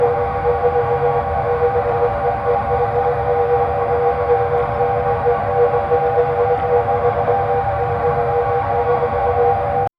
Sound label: for a cute tank shooting, tank